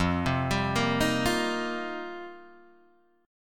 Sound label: F 7th Suspended 2nd Suspended 4th F Guitar Open D